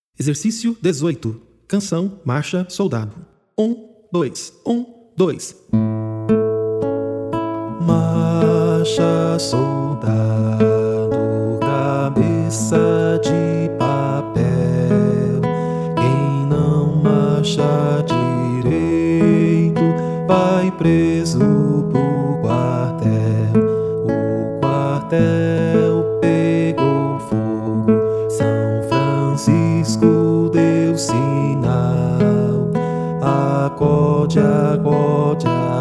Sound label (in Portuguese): Marcha Soldado Voz, violão